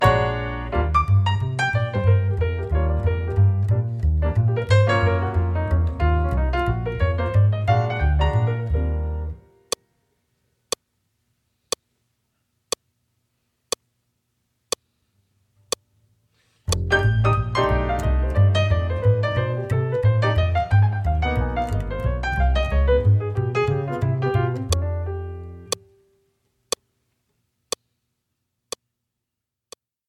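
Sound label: Jitterbug piano bass click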